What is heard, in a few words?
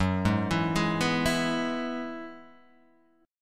F sharp 7th Suspended 2nd Suspended 4th, down